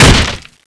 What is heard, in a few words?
wood, weapons